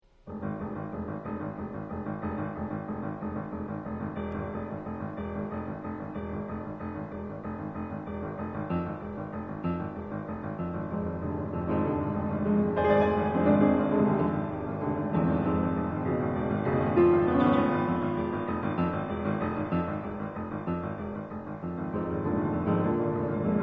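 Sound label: La Valse Mouvement de valse viennoise, piano; Ravel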